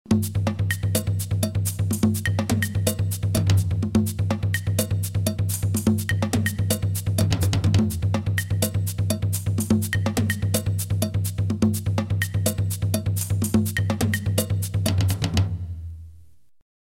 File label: Frantic Drums; macbeth soundtrack